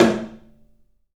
TOM, 3D Percussives; E-MU Producer Series Vol. 5 – 3-D Audio Collection; PA Toms

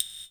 GATE BLOCK; Crypton; DrumBase5000

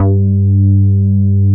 JNO MELO G3, MELLOW BS; Drums In Your FACE!

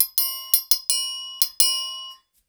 90, Percussion Loops

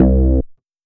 Bass one shot C, Basses; Electronic Point Blank

